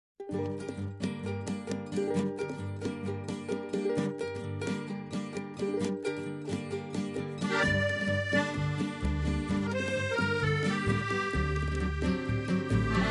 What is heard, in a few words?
tango